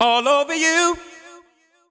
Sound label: deep house male, 125, A, vocals